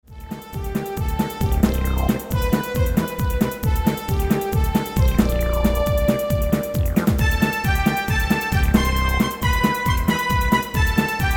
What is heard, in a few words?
Fanfare